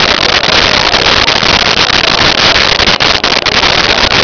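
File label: ambience crowd bazaar loop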